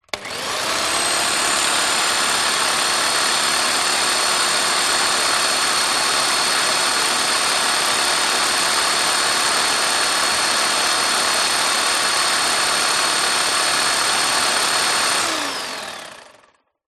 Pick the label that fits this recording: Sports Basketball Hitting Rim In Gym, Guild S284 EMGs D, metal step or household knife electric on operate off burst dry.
household knife electric on operate off burst dry